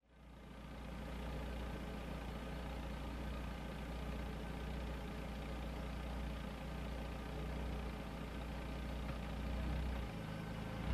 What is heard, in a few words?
Old car approaching and parking